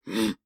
Shield Raise

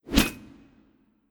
Human Hunter Throw

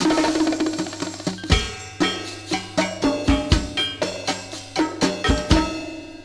Loading + outro; Vib-Ripple; PS2

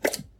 frog eat sound

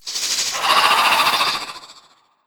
Naga Scream; Naga Scream1

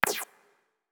Projectile Burst; Grab Spitter

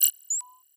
Confirm

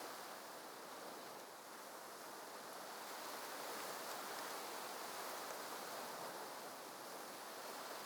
Wind Loop Grass; Dirtmouth; Wind Loop B Grass

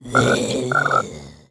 E Croaker idle

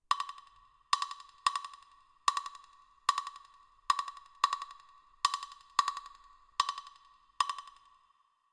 Combat loop nur Claves; Combat-loop-nur-Claves-B; curious expedition 2 macos ps4 switch windows xbox one xbox series xs gamerip 2021

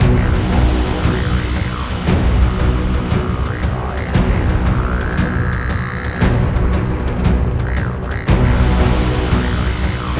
Sea Combat Jungle Day

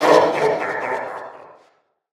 Finalboss Vox Taunt

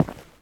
Footstep Rock

